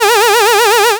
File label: airhorn